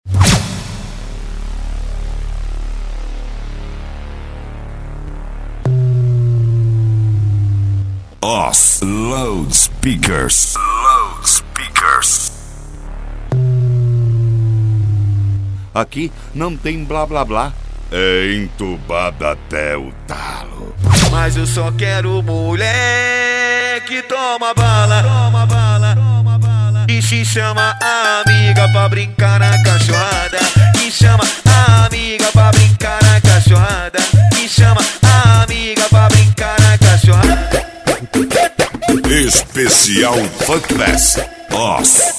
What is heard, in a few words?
loudspeaker funk bass; oz loudspeakers